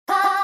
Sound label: bah